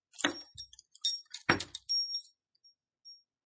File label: Keys Rattle Against Wooden Door; Miscellaneous Doors